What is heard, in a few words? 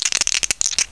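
dice shake; VEGAS Girls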